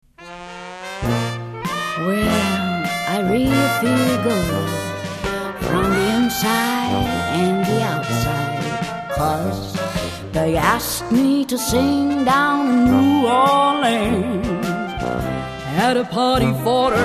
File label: sing for the king